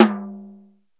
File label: sfx perc